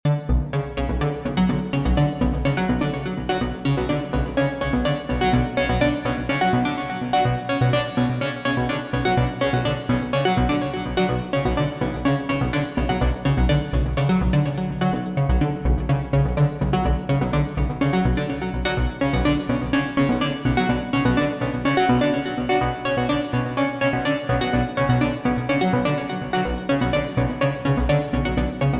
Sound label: Arp Plucks; Tracktion Abyss